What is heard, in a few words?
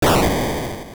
Bomb Blow; Zelda; Oracle